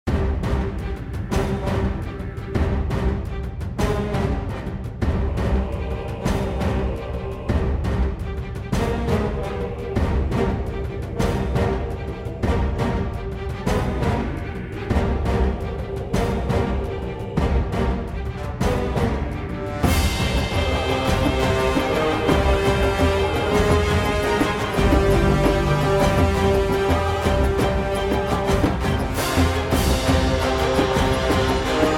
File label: Wind Music (Wind MiniBoss); children of morta